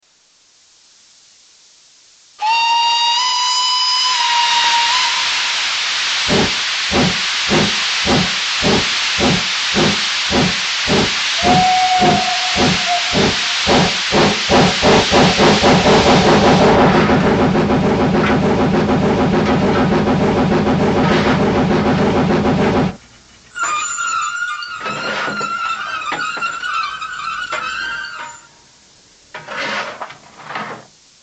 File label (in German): Dampflok C 5/6; Roco; 2691 SBB; Roco 43335; Made by Razis